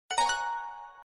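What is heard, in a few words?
sms sound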